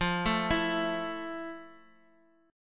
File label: F Major 7th F